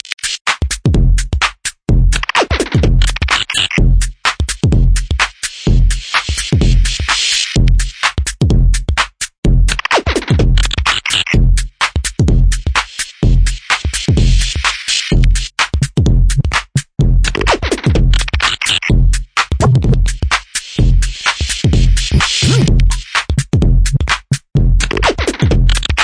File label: spaced techno experience